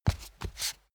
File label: step barefoot wood parquet scuff; menschen; schritte